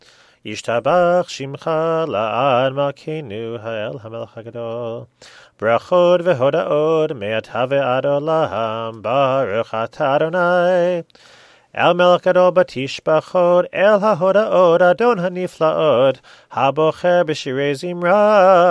yistabach chatsi kaddish

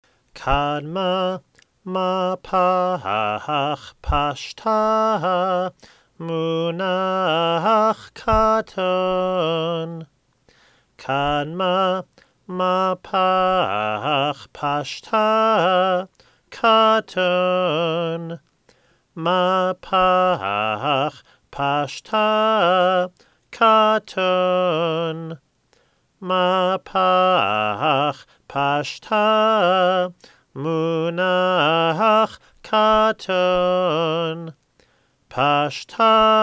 Torah Trope Katon

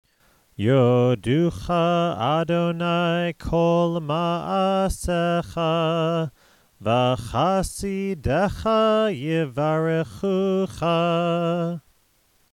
ashrei

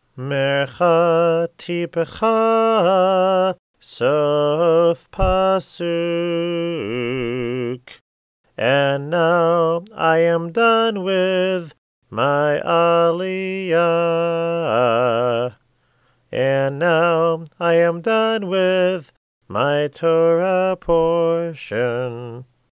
Torah Trope End of Aliya

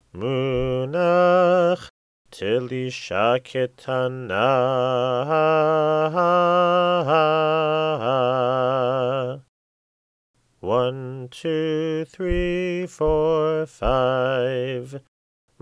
Haftarah Trope Telisha Pazer

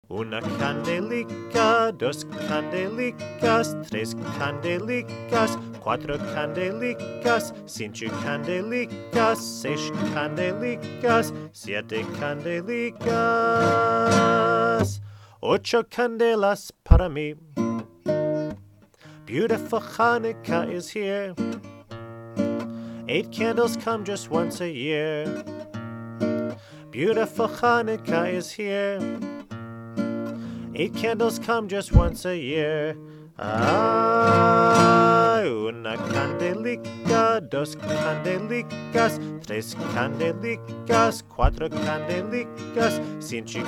Ladino English; Ocho Candelikas

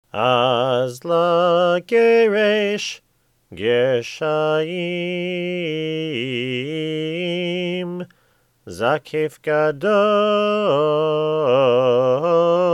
Haftarah Trope Azla Geresh

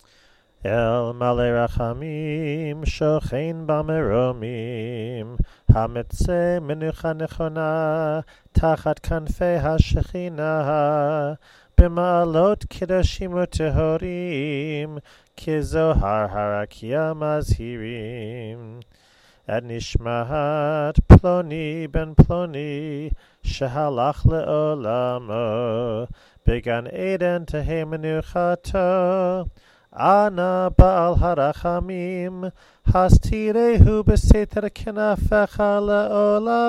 eil malei for man slower

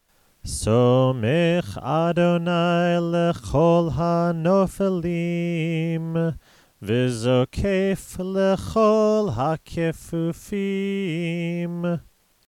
ashrei

